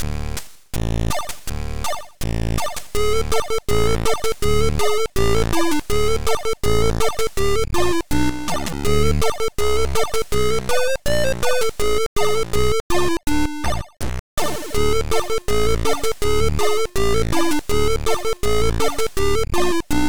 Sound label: Ukrainian Folk Punk